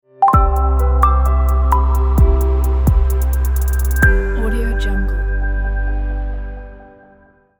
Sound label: technology logo